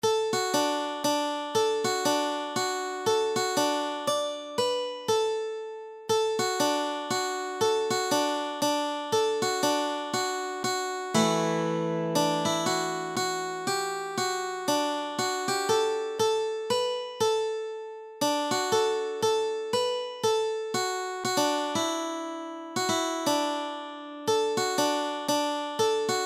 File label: waltz, Dulcimer